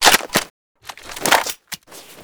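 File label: weapon foley rpd reload clip in; Call of Duty Modern Warfare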